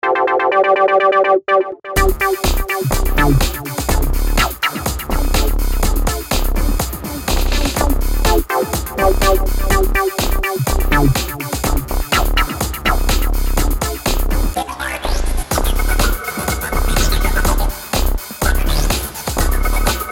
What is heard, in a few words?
Ambient Forest Love